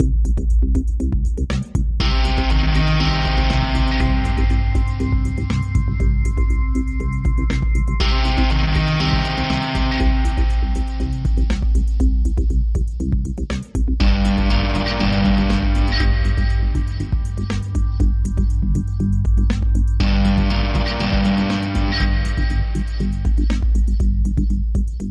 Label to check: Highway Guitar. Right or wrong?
Right